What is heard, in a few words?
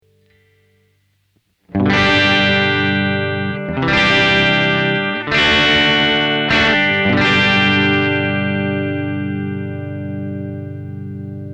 Solo Crunch, Amplug AC30; branche direct dans l'entree ligne du macbook pro; l'AC30